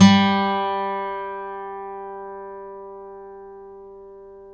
STEEL F G2, Synclavier, GUITARS; Synclavier Sampler Library

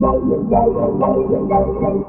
ORCHFX, orchestra, Asian Traditions

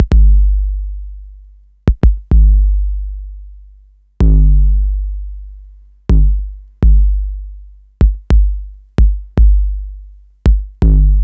808 tape kick

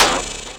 SD HOU, Dance Mega Drums; Best Service

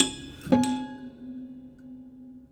Prepared Piano; Battery 3 Sample Kits

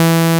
SOURCE SAW

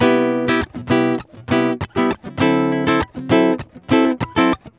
GUITAR SAMBA

